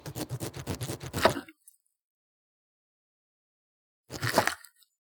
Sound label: Cutting Lemon Or Orange